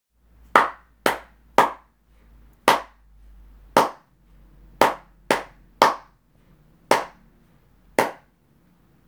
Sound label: Clap; C5 HITS; Collaborative learning; student